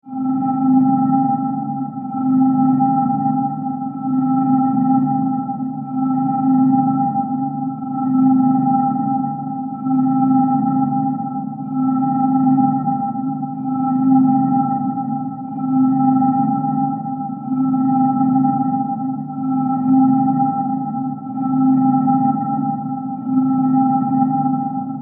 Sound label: Science Fiction Space Station Alarm; Spacecrafts